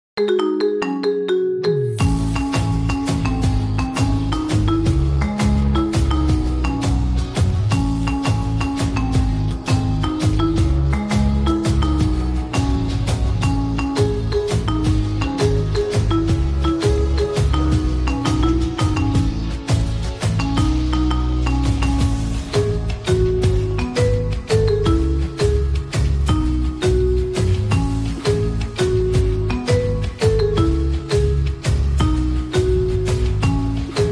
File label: Marimba Remix